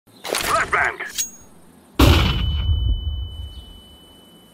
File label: flashbang; counter strike 2